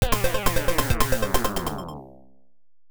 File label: Lose; Densetsu Ellena; PC-98